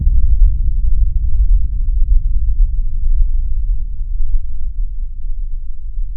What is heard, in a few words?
very low bomb, synth bombs; Zero-G Ambient 1